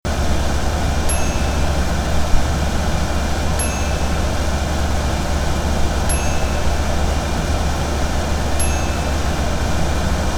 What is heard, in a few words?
AC